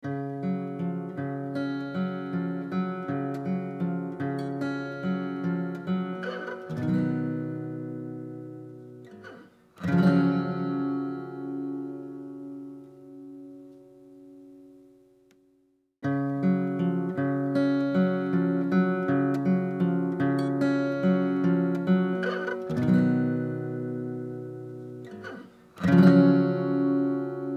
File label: Acoustic Guitar; Tverb; Preset: Dyanmizer